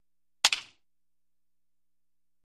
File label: Baseball Bat Hits Woo; baseball fx & games